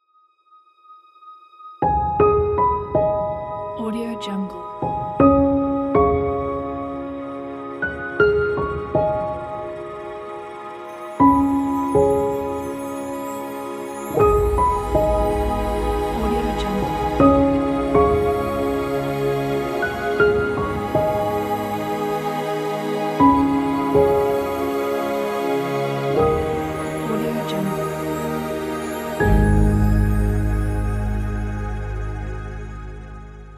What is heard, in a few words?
emotional fantasy cinematic intro